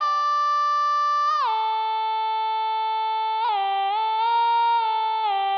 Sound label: Shana, Hip Hop Exotica, 086 Dmin